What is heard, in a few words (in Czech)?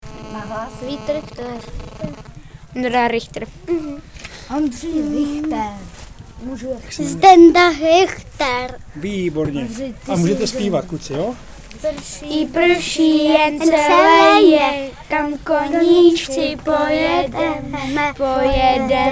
slavíci; ornitologický víkend